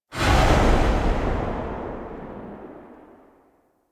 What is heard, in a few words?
Shotgun Tail; Weapons; DE; Warframe; Tenno; Pod Shotgun